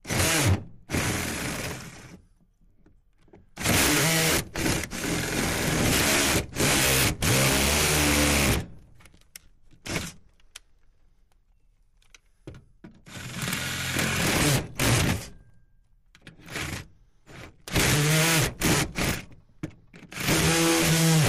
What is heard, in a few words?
Machine Drill Hand; drills